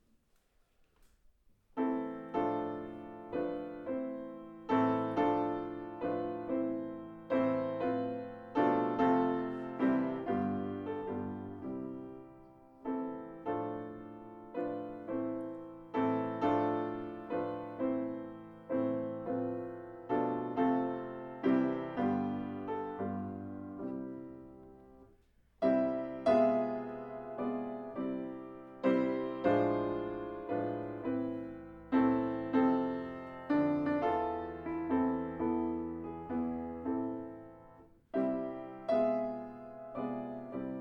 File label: Concert; Thiers 20:09:2015